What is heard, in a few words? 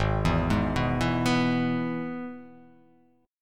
G sharp Minor Sharp 5th; 4,7,6,4,4,6,x,x